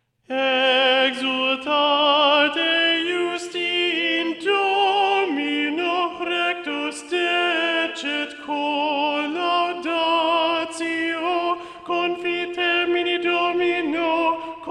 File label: exultate justi satb alto predominant; viadana